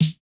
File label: snare, snare house